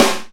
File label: drum hit clap